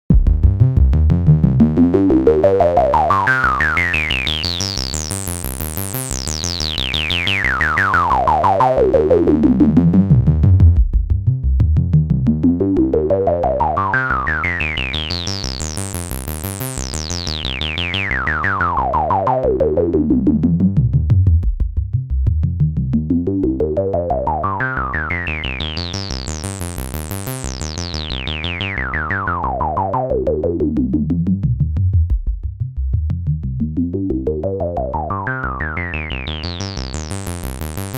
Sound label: filter types